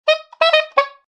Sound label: A saxophone beeps.; Funny Musical; Sound Effects:Musical